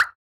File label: alien No Hit; firstContact; citrusRemix